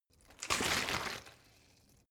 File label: bottle water sparse shake single; berufe